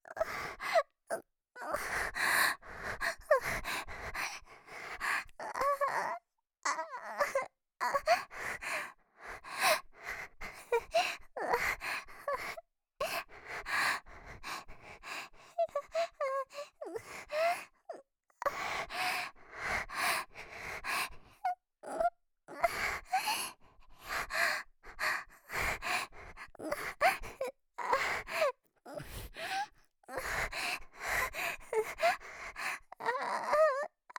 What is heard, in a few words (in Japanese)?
ぁっ…やっ…, 左耳, ASMR